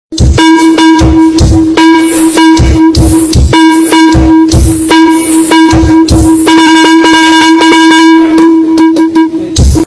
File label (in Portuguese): tun tun forro